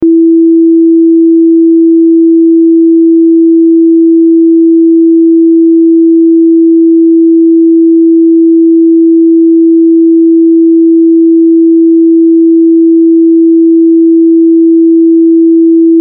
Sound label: high e string 6 string guitar